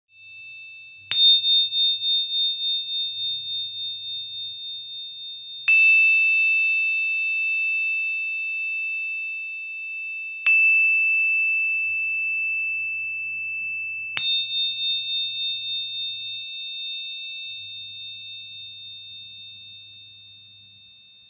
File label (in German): Klangstäbe Schlaginstrument